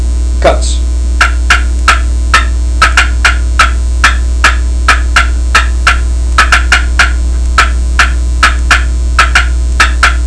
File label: stainless cutts, turkey; Southland